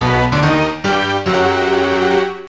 Fanfare, Treasure Opens; captain flag; arcade

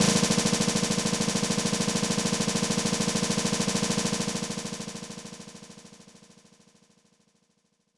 Drum Roll; snes; super famista

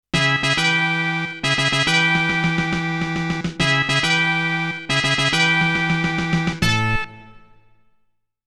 Battle Victory; Koei Original BGM Collection

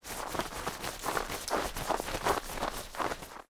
Suspect Escaped

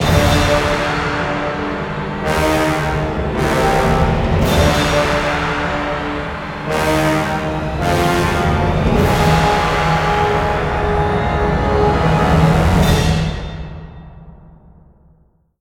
Stingers; Section 8

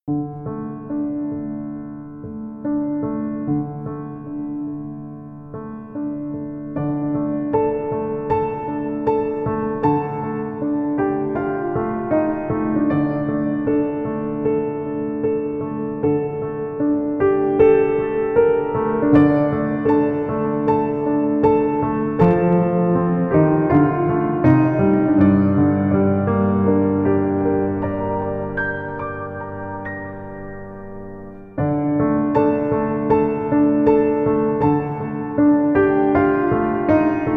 Piano Cover; Van Helsing II

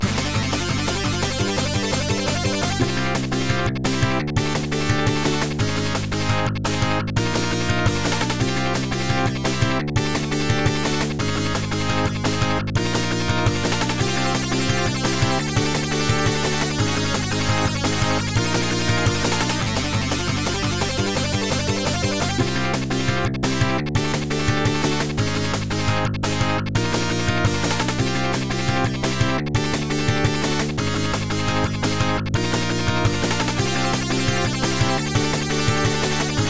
Win Music; Toughman Contest; Genesis

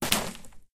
Wood Crack; Doodle Jump 2

